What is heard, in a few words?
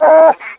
parasite pain